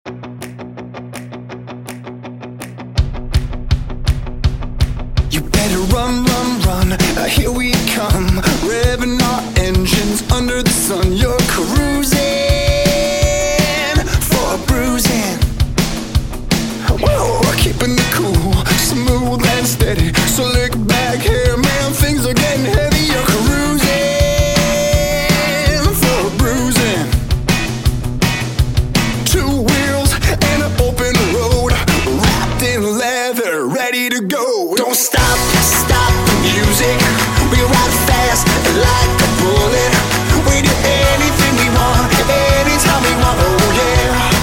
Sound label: Jazz Hip Hop